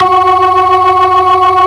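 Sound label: B3 low distortion, ToneWheel Organ; E-MU Formula 4000 Series Vol. 3 – Analog Odyssey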